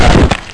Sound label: hornet hit, monsters; AoMClassic